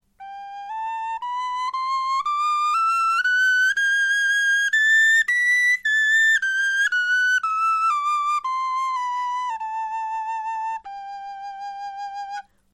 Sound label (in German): tonleiter